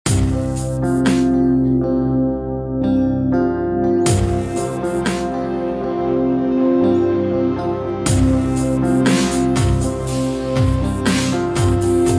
Epic Cinematic Electronic Futuristic Action Movie Theme